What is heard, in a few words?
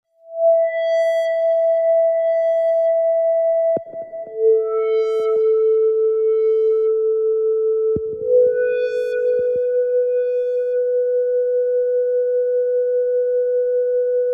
synth